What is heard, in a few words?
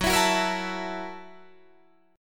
Gb 7th Sharp 9th Gb Guitar Open D